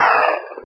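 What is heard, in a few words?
Earth Nerpa damage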